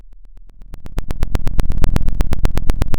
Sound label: Sound of a fire crackling